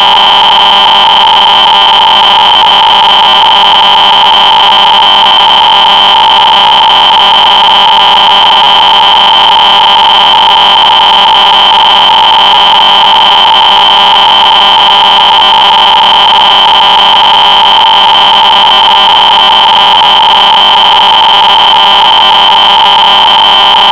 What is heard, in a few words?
sine